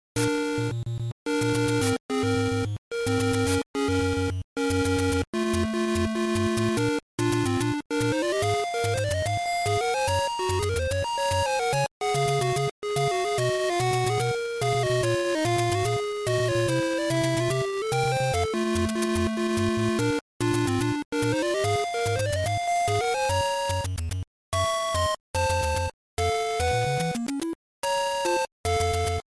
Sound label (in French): son musique de fond; Zelda; programmation labyrinthe